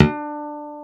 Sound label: D3 HARM, STIK BASS; D-STIK BASS1